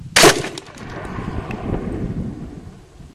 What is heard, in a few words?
piat fire, Guns